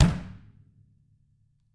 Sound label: TAMA, Drums